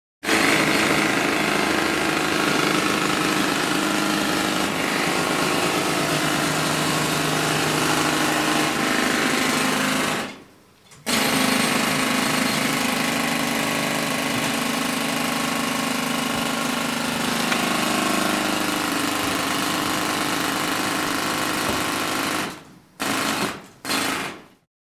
Jackhammer Interior Or Underground Close Perspective